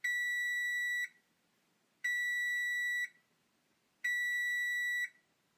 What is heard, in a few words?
oven; butterflysoup2